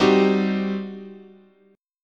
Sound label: G Major 7th Suspended 4th Sharp 5th, chord, guitar